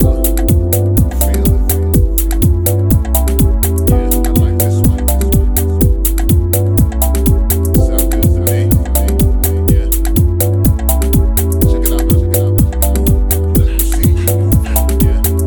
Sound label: 124bpms complete loop